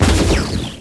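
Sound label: MISSILE; CYBERSPD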